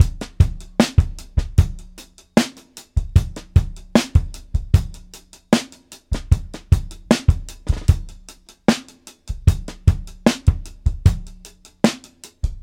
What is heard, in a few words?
76 bpm drum loop d sharp key